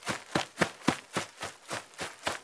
keep running

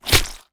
atk slime

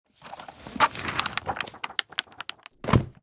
close book

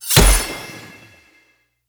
glowsword

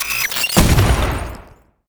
skill robot explo

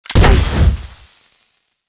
grenade launcher shot

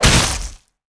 saw

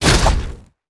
jump hammer

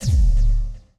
ui map unlock

